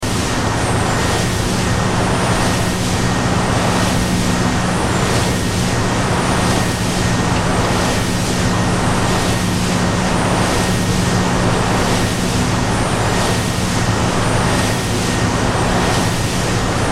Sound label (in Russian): zvuki vetrogeneratora